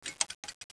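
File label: Gate, pinball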